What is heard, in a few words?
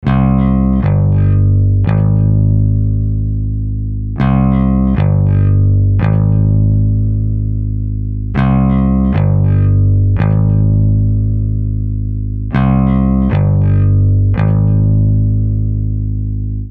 115 C Thick Bass Guitar